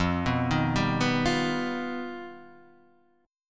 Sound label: G Augmented 7th